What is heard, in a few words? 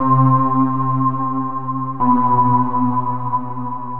Horror Bell